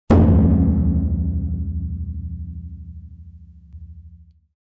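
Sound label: a large ancient drum being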